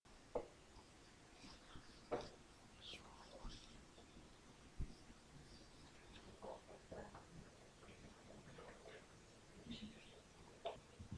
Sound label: beat